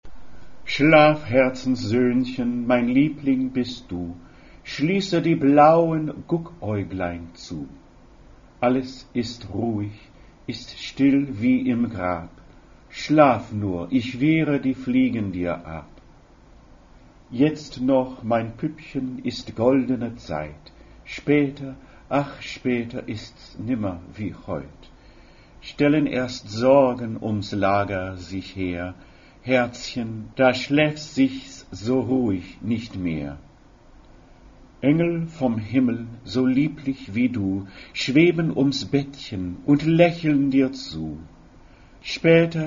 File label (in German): wiegenlied; J. S. Bach